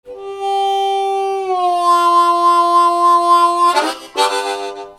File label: MUSICAL